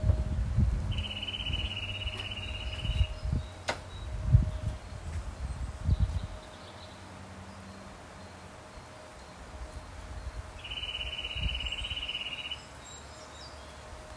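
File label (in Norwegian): fuglelyd dommerfløyte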